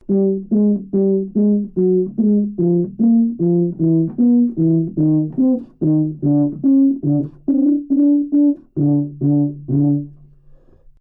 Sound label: tuba G3 phrase mezzo-forte tenuto; solo instruments